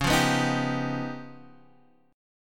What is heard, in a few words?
C sharp 9th Flat 5th Guitar Standard; strummed; chord; x,4,3,4,4,3